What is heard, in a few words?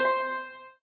line bet up; crystal Gems; Core Gaming